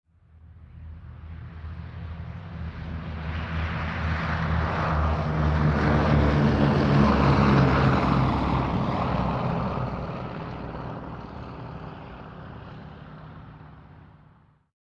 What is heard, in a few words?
boeing b17 ext take off by; sonniss gdc